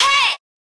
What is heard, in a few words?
vox